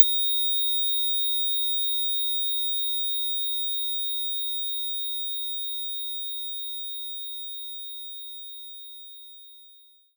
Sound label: Glockenspiel D6; knights try